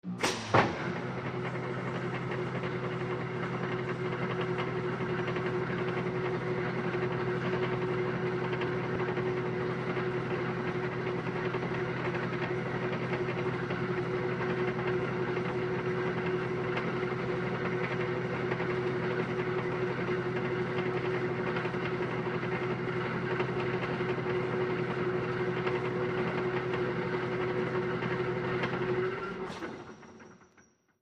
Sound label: Crane Motor Movement, Steady, Cu Overhead Perspective.